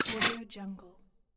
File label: mechanical scifi interface notification